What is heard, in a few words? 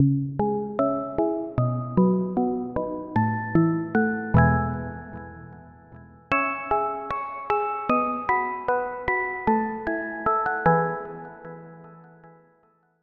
Pluck, Sylenth1; Pluck - Baby Rattle; Routed Sound; Sylenth1 Expansion - Story Walker Vol.1